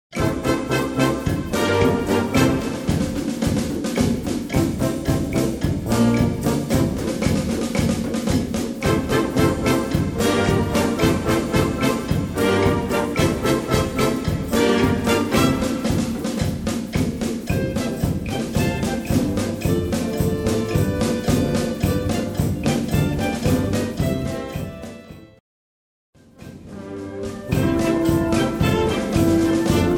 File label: Cha Cha